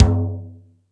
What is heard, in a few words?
Toms, E-MU Pro-Cussion